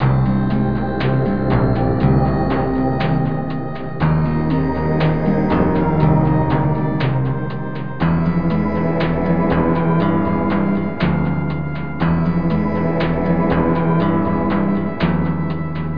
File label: epic